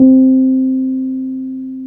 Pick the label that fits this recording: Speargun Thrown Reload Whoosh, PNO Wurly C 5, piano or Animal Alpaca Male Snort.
PNO Wurly C 5